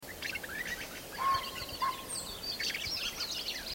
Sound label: Dusky Thrush, songs